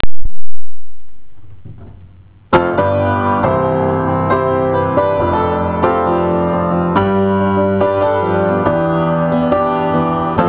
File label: Piano Composition